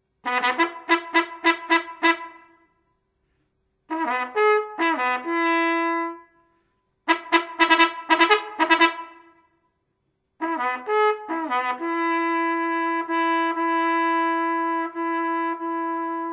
Wecken Post

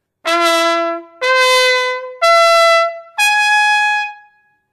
Das Ganze

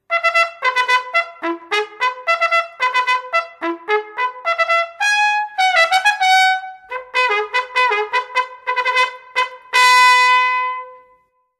Satteln, Kavallerie

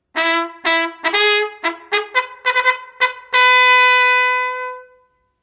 Eskadronskolonnen bilden

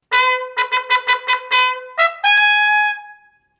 Kommandeur Ruf

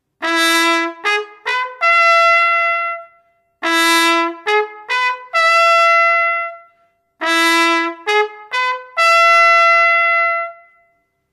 Ruf zum Gebet

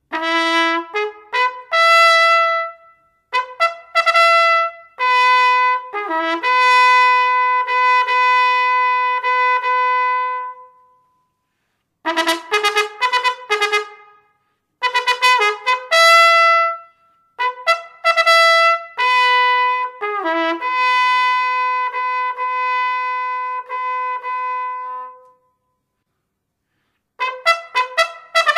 Wecken Post

